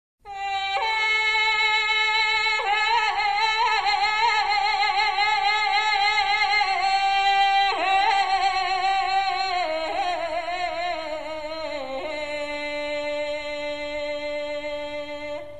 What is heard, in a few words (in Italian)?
Voci Bulgare Esempio Cromatismi Donna sola; Cori Polifonici